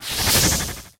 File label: flip, oracle card